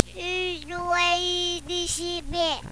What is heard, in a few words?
a Reverse